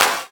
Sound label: good clap single hit D key, Royality free clap tuned to the D note. Loudest frequency: 3392Hz; single hits one shots